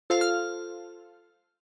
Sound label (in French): reparation finie; La-Fabrik